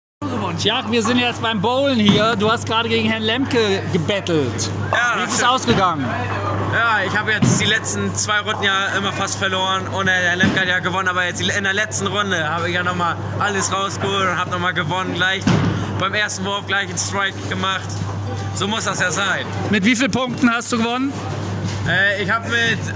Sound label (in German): Bowling